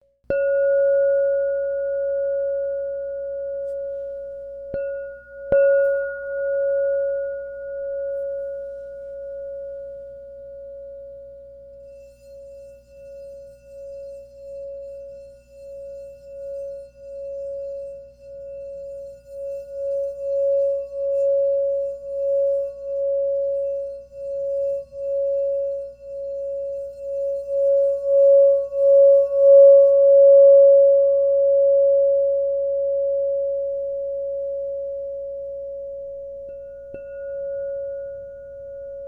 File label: Crystal Tones Singing Bowls